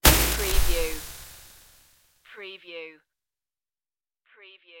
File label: futuristic bullet impact